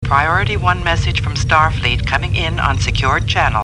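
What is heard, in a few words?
priority one message, sonnerie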